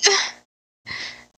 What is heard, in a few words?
Moan